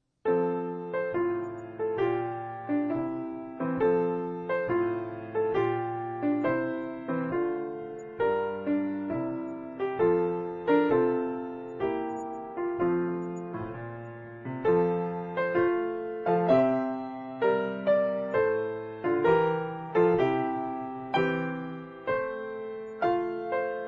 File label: piano